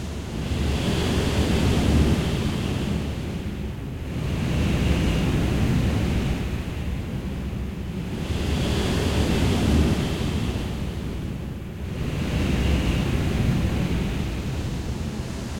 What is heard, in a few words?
hungry Beast; SnootGame